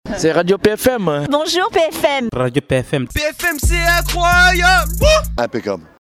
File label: JINGLE